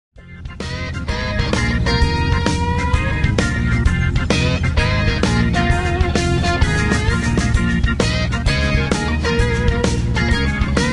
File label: Taxi Theme; Dude Theft War; Android; Duber Taxi Theme